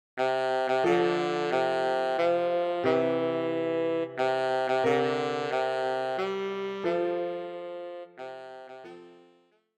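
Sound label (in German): Happy Birthday Tenorsaxophon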